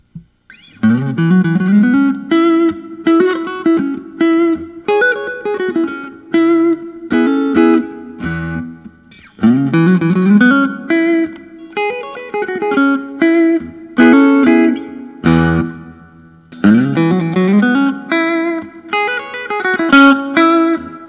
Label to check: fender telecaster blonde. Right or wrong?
Right